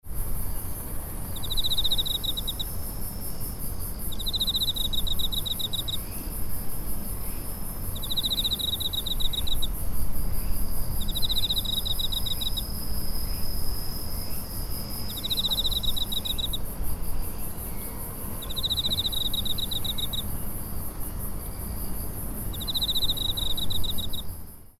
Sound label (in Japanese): aki mushi semi, soundscapes; 秋の虫とツクツクボウシの共演; 2019年の金谷川は，10月に入っても，昼間は半袖Tシャツで平気に過ごせる気候だ．そんな陽気の中，秋の虫とツクツクボウシが同時に鳴いていた．